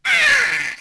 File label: spewer death scream